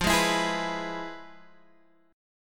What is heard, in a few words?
F Minor Major 7th Flat 5th Guitar Standard; FmM7b5 strummed; JGuitar